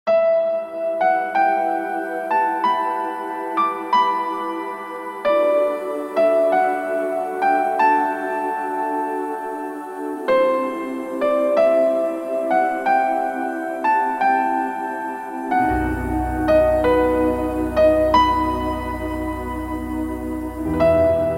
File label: Vast Places Looping